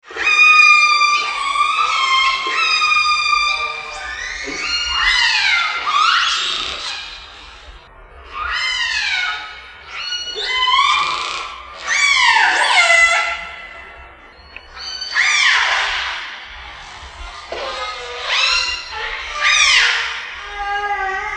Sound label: orcas